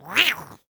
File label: Monster Small Green Human type Attack